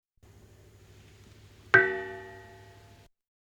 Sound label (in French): cloche; La Celle